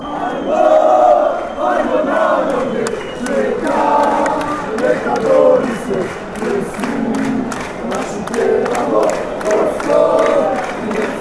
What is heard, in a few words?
bosnia chant